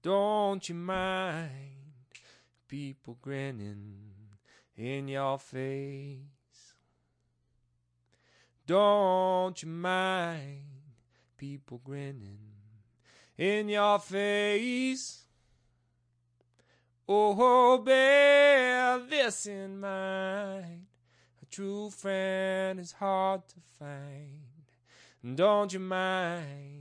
acapella, blues; remixable collections

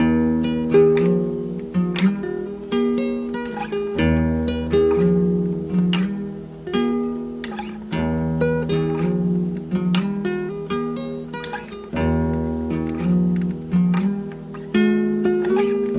shadows in darkness guitar, folksy; REMIXABLE COLLECTIONS; Folksy Guitar and Voice Stems

